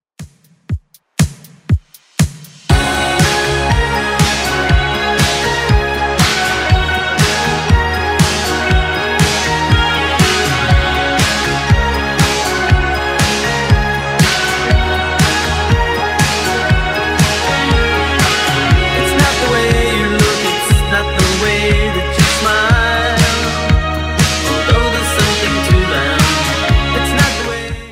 80s Redrum 120bpm

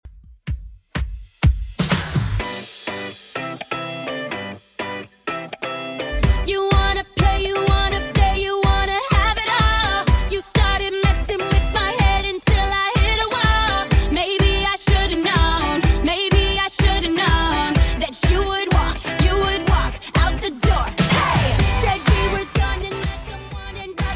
125 bpm

